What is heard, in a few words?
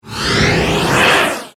Whoosh Flange, Trail Off, Jet By, Transition; Production Element, Imaging Element, Accent; BlastWave